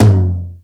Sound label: Toms